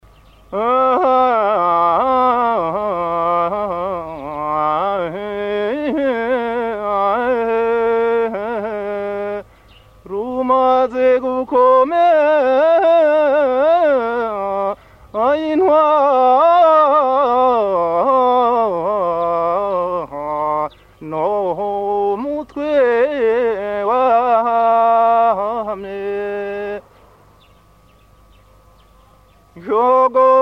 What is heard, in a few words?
praise song, Burundi, Tutsi